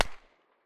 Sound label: silencer mp9 mono far, weapons